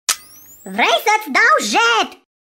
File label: Jet; RoBotzi; Funny; tonuri haioase; ringtone